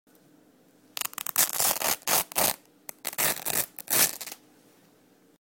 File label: velcro